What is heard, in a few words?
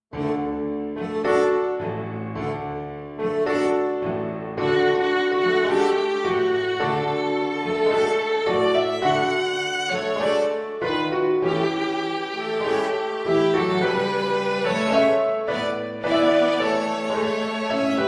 Tango in D Quartet Computer Sample